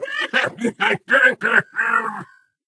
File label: ballbot laugh; player